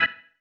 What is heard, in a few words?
Stab One Shots, Organ